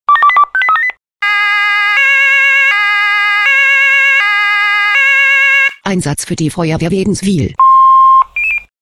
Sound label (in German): SMS Alarmierungstone; FW Waedenswil